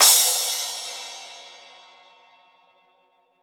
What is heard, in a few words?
Raw Crash, Drums Acoustic; USB Soundscan vol.10; AKAI; ALL CRASH